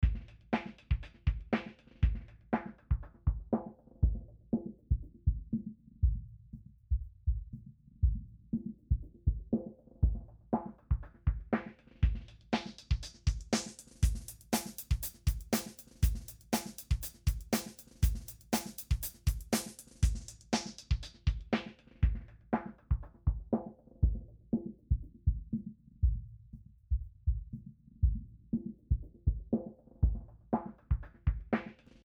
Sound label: Drums, 24 db oct Sweeps; mutator demos